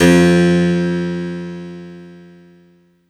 HARPSY F3, Harpsichord; Orchestral; FL Keys

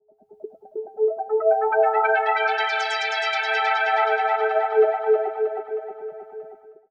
SPACE PAD 140 A; SpaceSounds

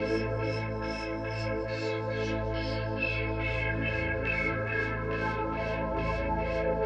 TECHNO PAD 140; Keys

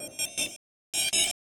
Industrial 160

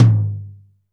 dry tom, acoustic set; drum

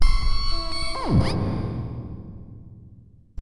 TECHNO FX 140

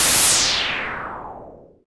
HOUSE FX 125

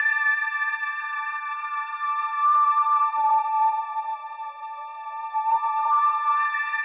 SPACE SYNTH 140 A; Keys; SpaceSounds

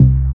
BASS MONO

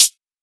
hat; Anthemic

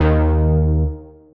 Bass, One Shots; Touch Loops; BlissedOutBeats

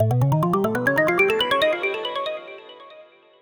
TECHNO EXTRA 140

